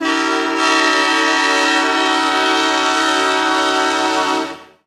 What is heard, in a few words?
Train Horn (Direct Source)